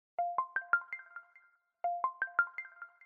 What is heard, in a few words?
incoming call sound